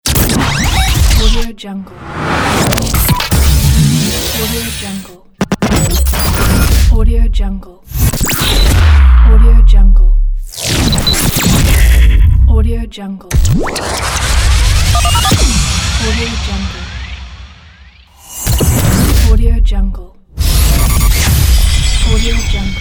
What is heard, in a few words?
Radio Imaging SFX; DASPro Studio; Radio Imaging SFX Pack 03